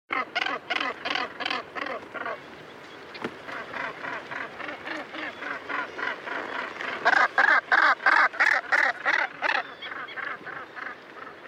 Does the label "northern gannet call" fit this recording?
Yes